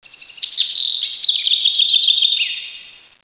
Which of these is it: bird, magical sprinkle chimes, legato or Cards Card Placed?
bird